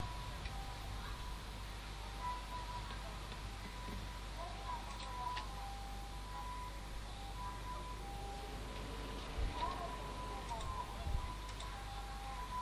sp saiseikoubou middle with siren not-under bofore rain